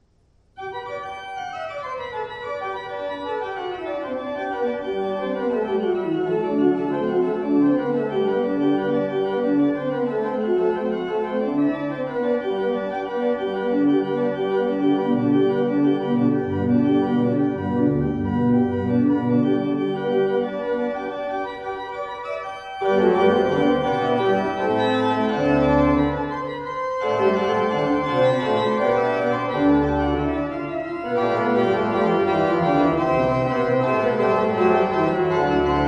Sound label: Simon